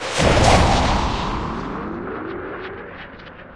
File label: teleport, machines; Garry's Mod; hl2